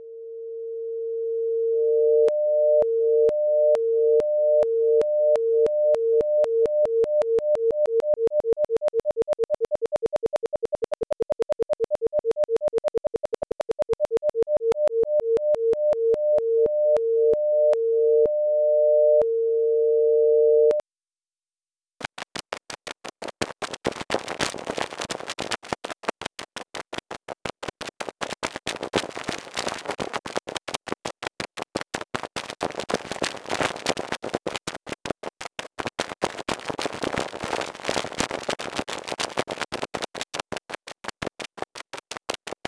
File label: footsteps